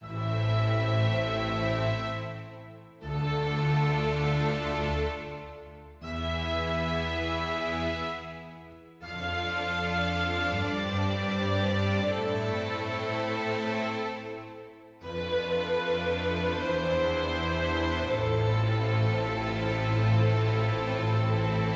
sad forte

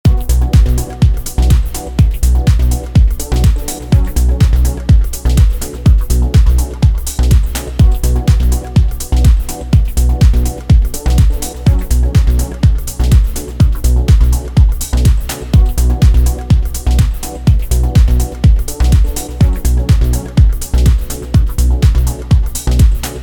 Techno; Impulse GP Super Bike Racing

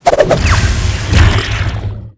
Boss Attack

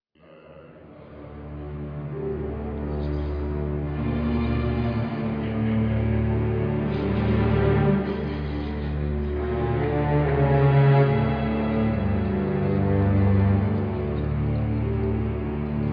Music Ambient; nosferatu the wrath of malachi

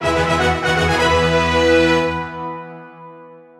Jingle Fantasy

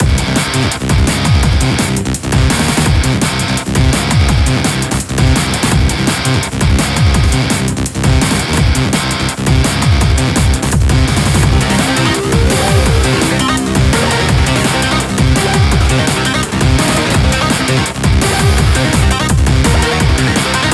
BGM Ingame

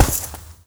warrior walk carpet; Aragami

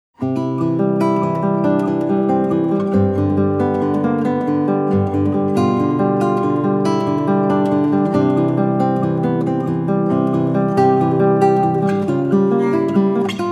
Melody of Lute

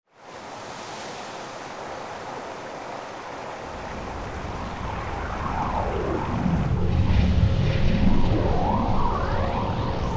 Appear

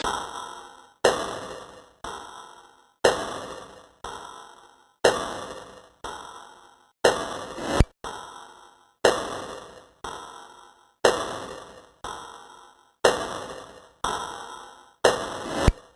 Cymbal; Cymbal Cat; Plasma Island

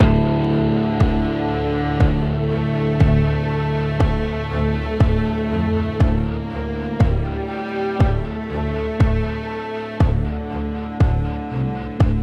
Tension Loop 120bpm 38bar; project zomboid

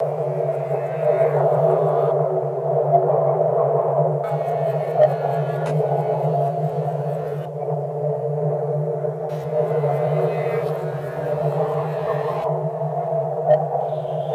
amb power Off; PS Vita

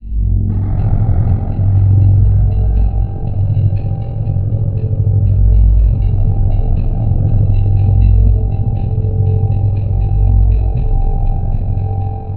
Drone

